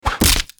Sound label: Arrow Wood Impact